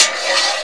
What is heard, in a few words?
METAL, INDUSTRIAL